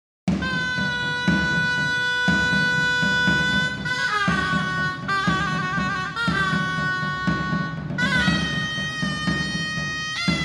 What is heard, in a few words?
Zurna